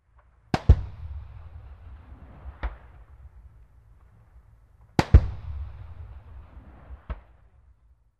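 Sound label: tank shot in the distance 2 times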